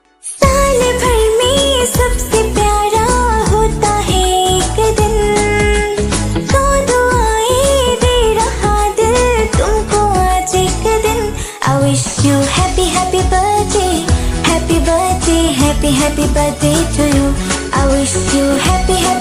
i wish you happy happy birthday song female version